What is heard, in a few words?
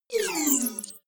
sci-fi menu close medium